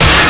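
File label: STACK BRASS, Ragga & Dub Reggae; RAGSTACKS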